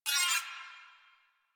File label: Space Drone Death; Warframe; Corpus Space Drone